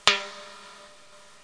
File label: drum